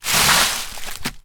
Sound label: foley foot kick dried leaves on small wooden bridge forest